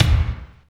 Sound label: KICK, Tekno Industrial; East West